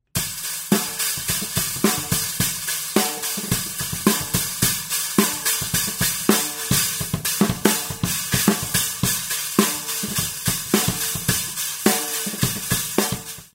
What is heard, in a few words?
Spiral Stacker Over Oriental China; cymbal; 10 Oriental China